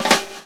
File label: DRMROLL; E-Lab; X Static Goldmine 2; akai